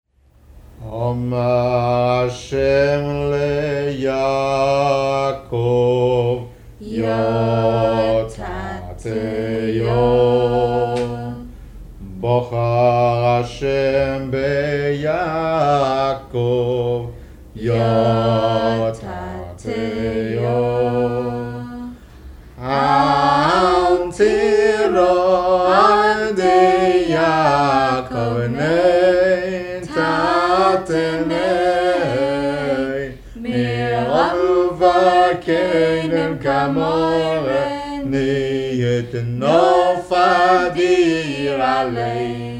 Family Singing

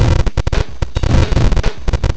drums

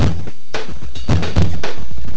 3 drums

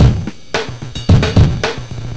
3 drums

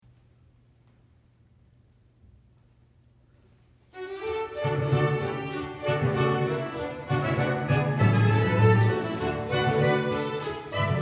Bourree

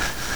blur

